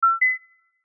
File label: notification